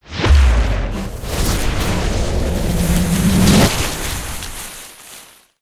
great tree breakthrough, ambience

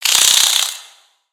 Spider Rage; Lego; creatures mechanical

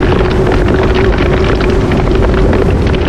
Spinjitsu Earth Tornado Loop

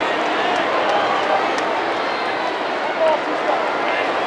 crowd loop

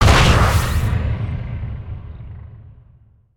Rocket Impact; ambience

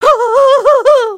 fear; emotes

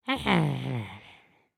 Sleep, creatures organic; Lego; Strombie

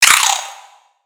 Spider Die; creatures mechanical; Lego

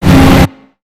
Robot Air Flail; creatures mechanical; Lego

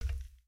Foot Steel Boot Walk, footsteps

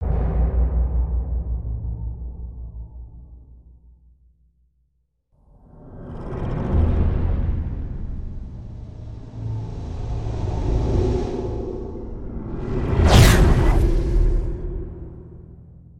Camera Mvt; Intro; cinematics; Pet Rock

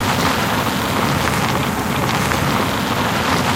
sand tread, vehicles; aronwk collection; ndaudio

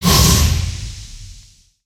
Bug Sprayer Spray

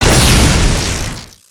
Plas Blas; foley

